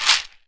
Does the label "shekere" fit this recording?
Yes